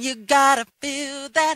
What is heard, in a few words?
you've got to feel that SUNG